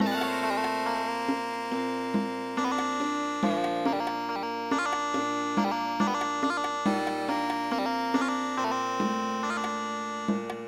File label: Indian Traditional; midi